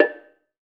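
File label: BONANG, Metalophones, Pitched Percussion; E-MU Producer Series Vol. 7 – Old World Instruments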